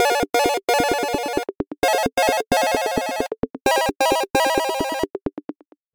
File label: carbon, alarm